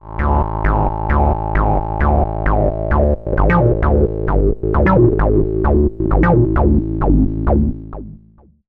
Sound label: Liquid Foam Loop; HerbsAndStones